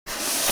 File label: Paint brush painting wood short stroke